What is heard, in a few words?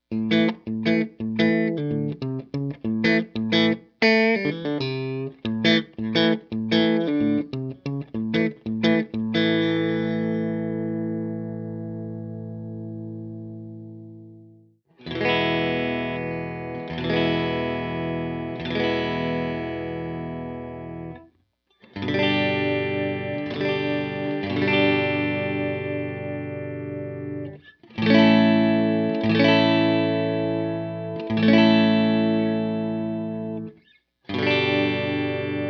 middle, pickups